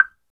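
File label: percussion wood, wood block; Roland LCDP11 Africa VOL-1; Wood & Metal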